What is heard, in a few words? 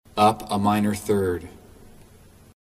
Asc min3